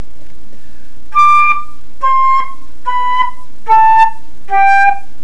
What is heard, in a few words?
Tongue stop, flute